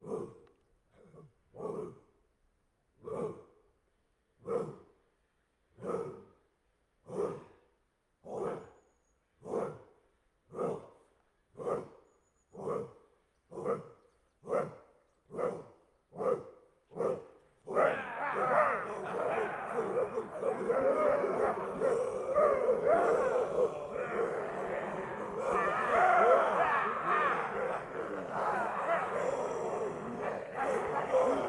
war unit small crowd men orcs shout intimidation short rythm repetition accelera